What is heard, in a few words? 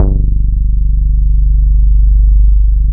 ROLLER BASS, Bass Analog & Synth, DISCO; USB Soundscan vol.12; AKAI